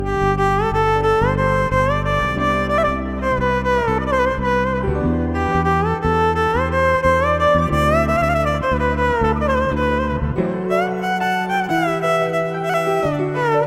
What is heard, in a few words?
Violin Tamil Ringtone